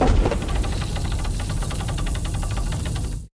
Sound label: free Fall Gate Snd; eldorado